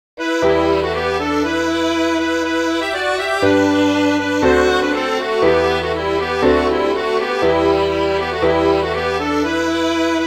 bourree; bourree d aurore sand complete

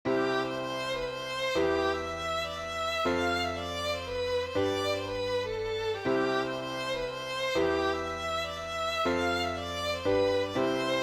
mazurka

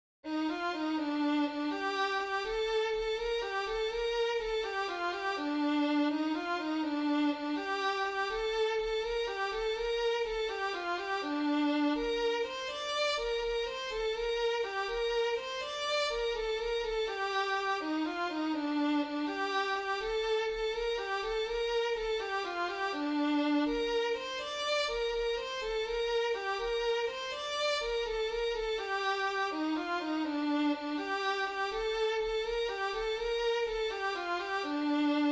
branle violon

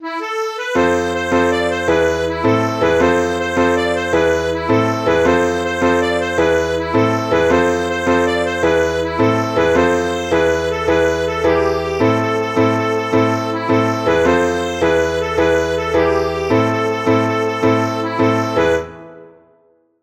ronde

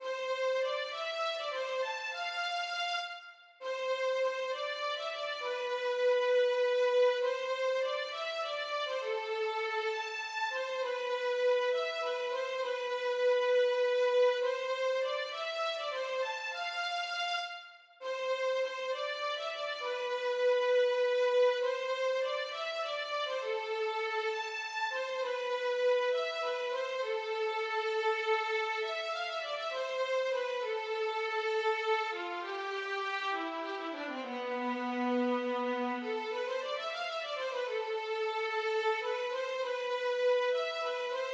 valse, voix2

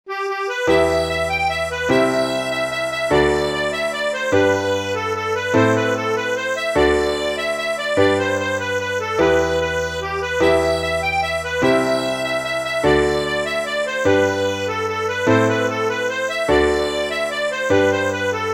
mazurka

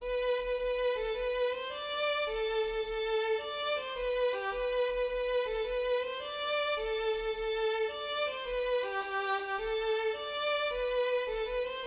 ronde, voix1

